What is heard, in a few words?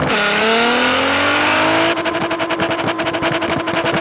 second, vehicles; nismor35